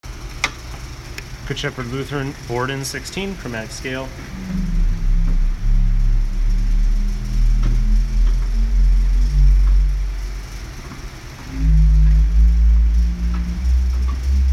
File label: Bourdon